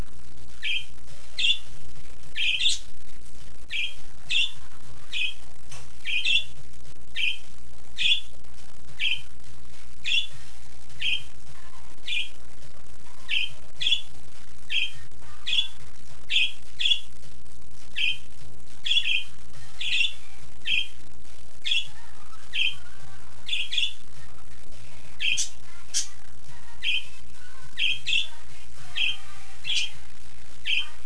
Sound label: Crazy Chirping - Ack Ack, parakeet